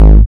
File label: kick hard beater